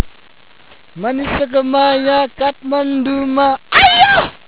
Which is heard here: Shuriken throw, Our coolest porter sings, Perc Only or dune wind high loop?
Our coolest porter sings